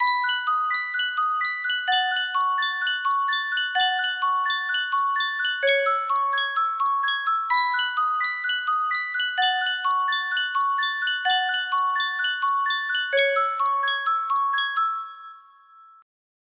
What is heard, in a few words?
sankyo custom tune music box, music box melody